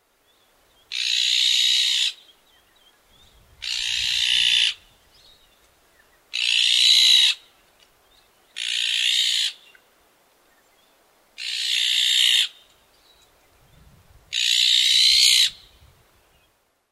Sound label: iberian magpie